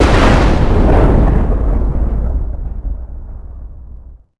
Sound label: thunder